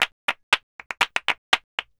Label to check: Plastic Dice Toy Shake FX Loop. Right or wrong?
Wrong